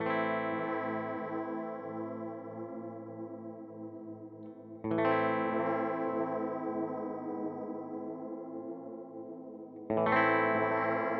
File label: Pigtronix Constellator